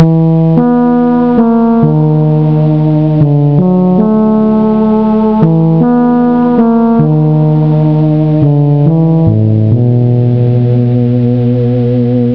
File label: EERIE